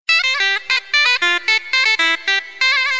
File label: Flute Sms; Nokia